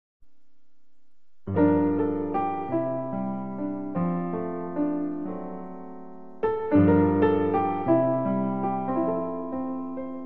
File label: Piano